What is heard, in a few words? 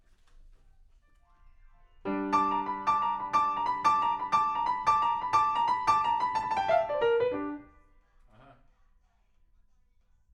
PIANO, RNR for piano